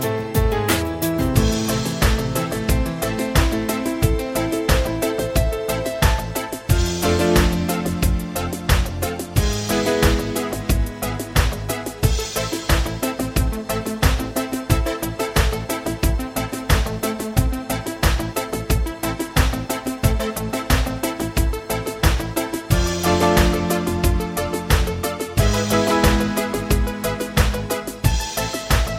Forte Piano

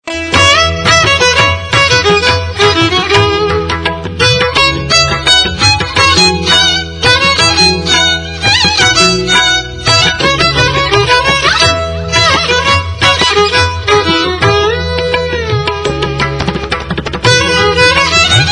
Indian violin

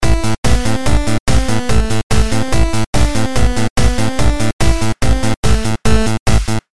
BASS; Tetris

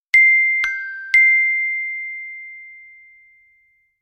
sms tone

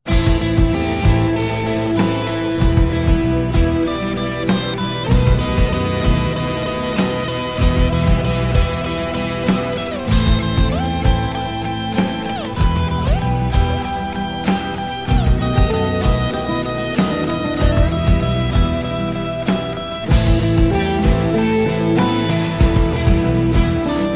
Guitar

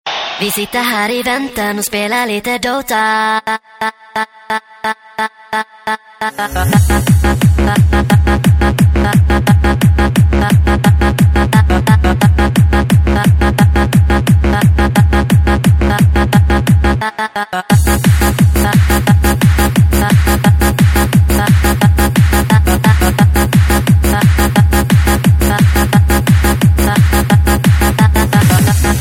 techno; electronic

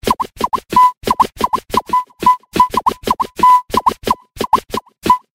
Hiphop Message

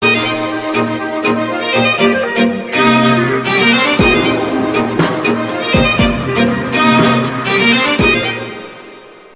grooves interlude, electronic ringtones

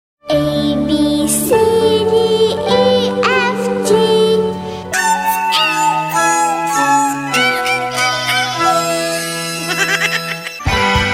funny abc song

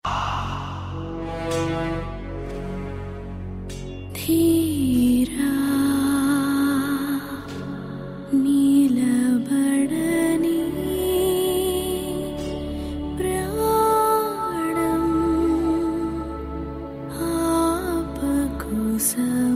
malayalam, ringtones

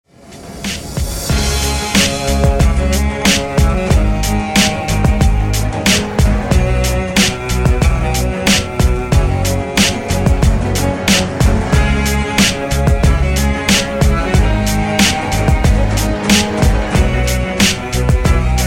Gaming Beat

